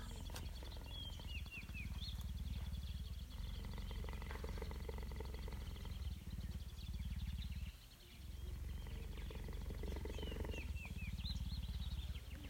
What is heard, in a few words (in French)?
Guépards